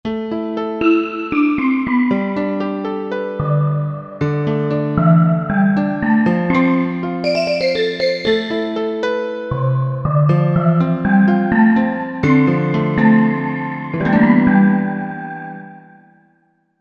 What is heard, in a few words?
Pno & Synvib